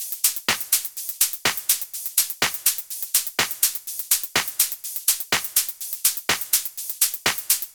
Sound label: DEEP VIBES TOP LOOP 124BPM